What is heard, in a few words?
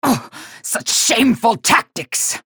pain ability damage; galena; unholy paladin; low health